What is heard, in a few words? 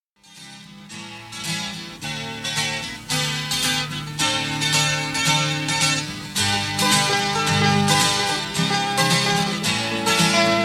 Music